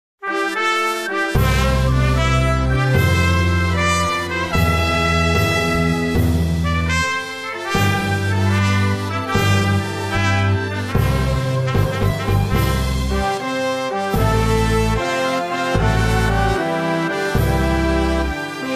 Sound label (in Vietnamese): phat giao viet nam karaoke